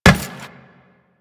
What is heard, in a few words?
Run Metal, Footsteps; Warframe; Teshin